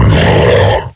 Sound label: Pound Attack